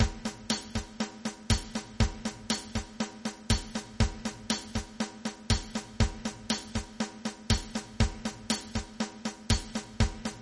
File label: funk, rhythm; traditional